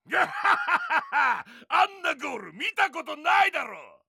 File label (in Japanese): ガッハッハッハッハ！; あんなゴール見たことないだろ！